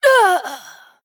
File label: Female Human Death